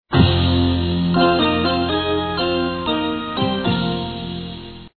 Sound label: Monkey Chime